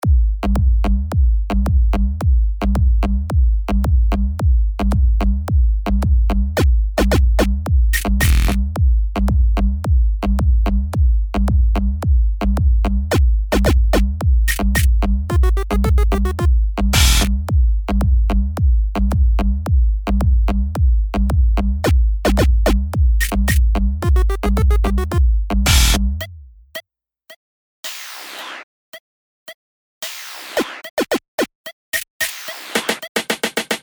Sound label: Song without guide vocals